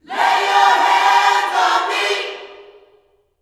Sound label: LAY HANDS, Vocal Planet Gospel